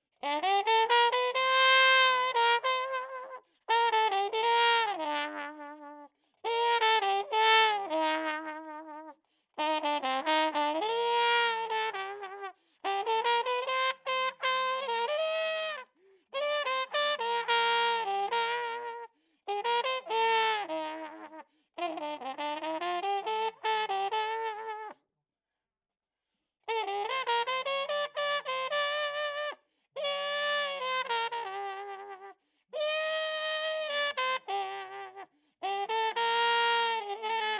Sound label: Trump; RE20